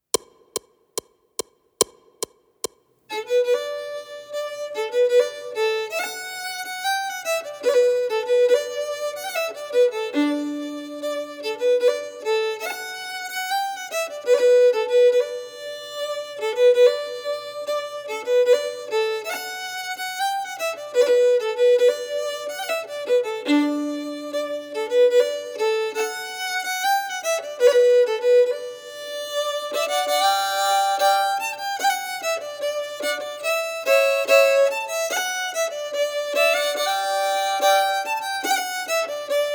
144 bpm

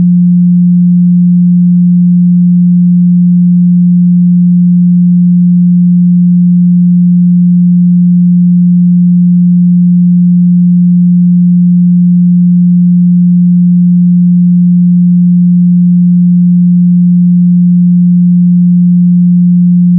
G string F

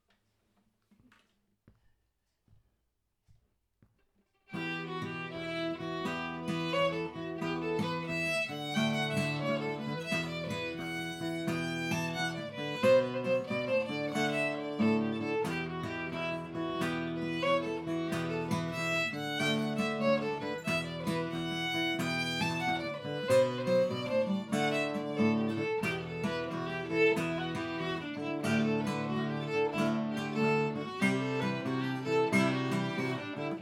Morrisons harmony slow

